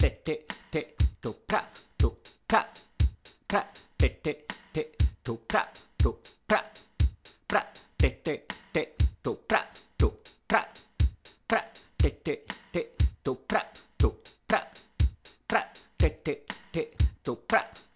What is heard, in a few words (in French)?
djembé